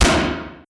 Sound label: Missile Hit